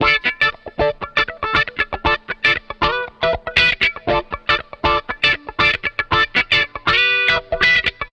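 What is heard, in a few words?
DISCO guitar WAH, 118 BPM, D; Drumdrops; Compact Disco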